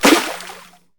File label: Bullet hit water; Hovl Studio; SFX Battle sounds; WhiteMan Unity2D